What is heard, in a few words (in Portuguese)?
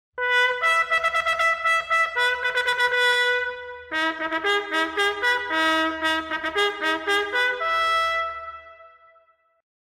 Refeicao, toques